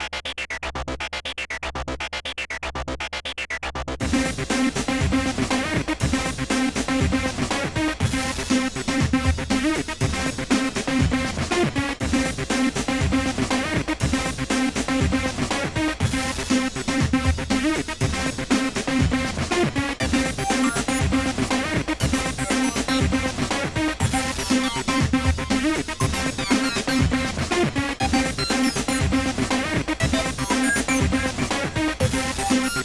Court Music

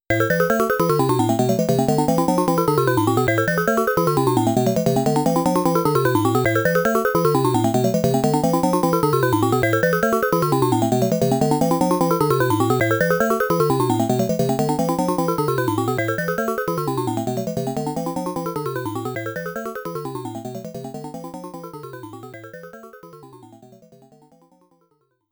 Capture Music; Galaga

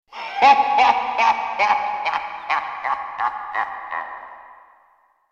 Scary laugh; F.A.M.P.S

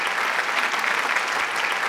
applause medium; birthday party bash; wii; Spanish

